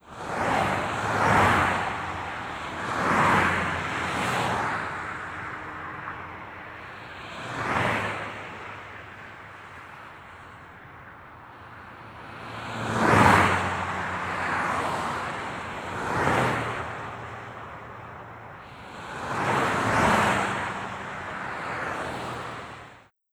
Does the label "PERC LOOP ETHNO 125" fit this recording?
No